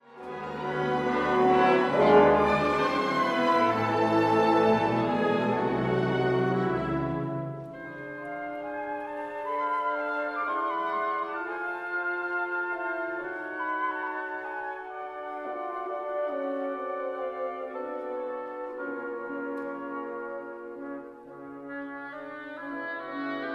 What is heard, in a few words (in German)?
Trompetenkonzert; Arutjunjan